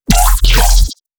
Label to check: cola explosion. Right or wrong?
Wrong